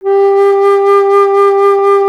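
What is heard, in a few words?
flute A; Miroslav Vitous - Solo Instruments VOL-2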